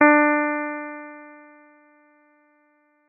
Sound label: Elpiano